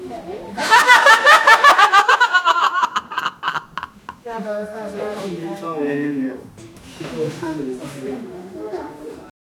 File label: cafe murmur and laughter, people